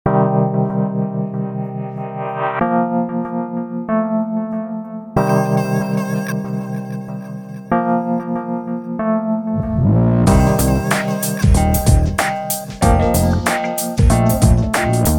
Big Thinking Beat

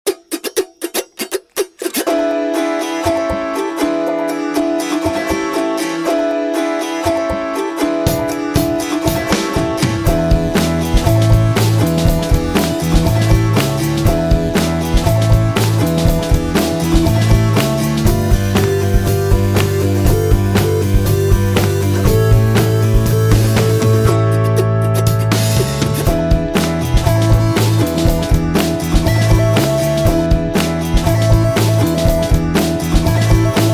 autoharp anthem

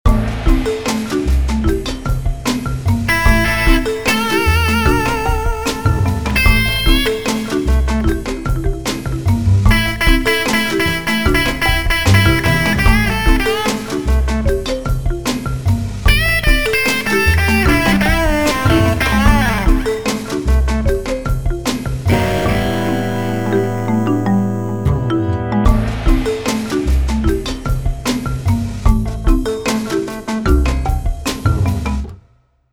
Fractured Jazz

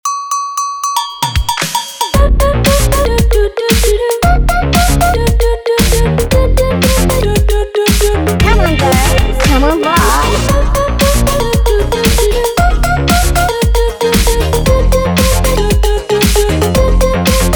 Catwalk Cowbells

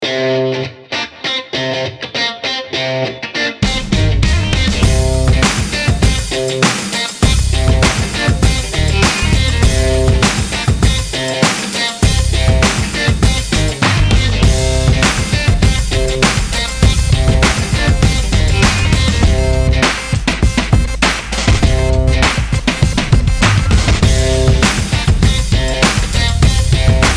Great Riff

